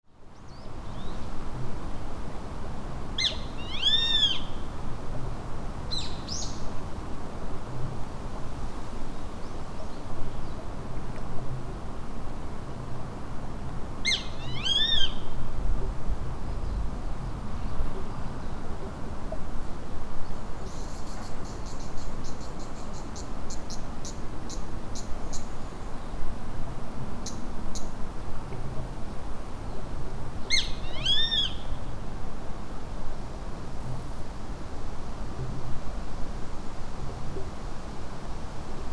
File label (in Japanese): sashiba; サシバ; 経済棟の裏山の放射性汚染物質の一時保管場の奥で鳴いていたサシバ．